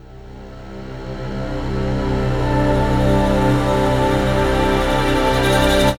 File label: PAD, Dance Mega Synth